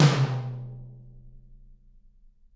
TT, Toms; Bob Clearmountain Drums I

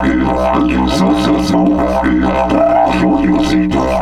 120, Didgeridoo

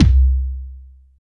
BOINGY KICK; Drums In Your FACE!

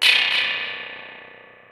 Sfx, Trance; Zero-G Trance Inducer; General Sfx

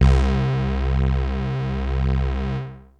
Techno leads, hits and riffs

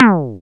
FM Perc; Rap

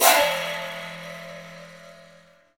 GUIRO

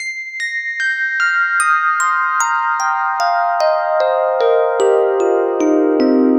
Piano Ep descent, Drum & Bass Keys; Sonic Foundry (Sony Creative Software); Knutrix Drum & Bass

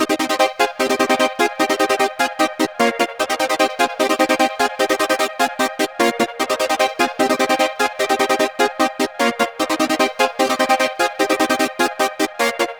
NOISY STUB, 150; Masterbits - Tekkno Trax; SQ LOOP 150

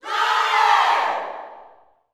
GLORY SHOU, Gospel; Spectrasonics; Vocal Planet

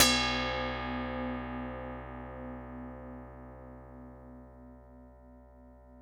ORIENT B1, ORIENTAL; Music Store; Yamaha VP-1

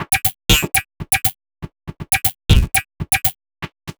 Odd Glitch, 120 BPM, Percussion; Sonic Foundry (Sony Creative Software); Underground UK House & Electro

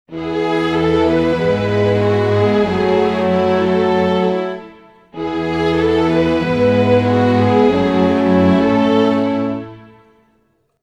95 E maj full mix, orchestral arrangements

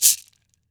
CABASA GOLD; Brazil

